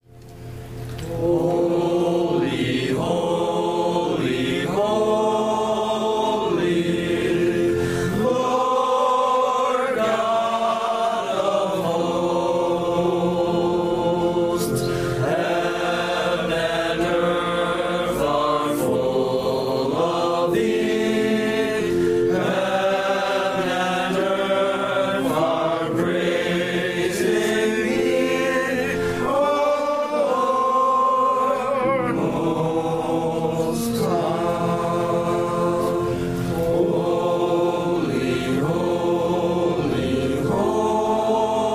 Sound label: chorus; Day Is Dying in the West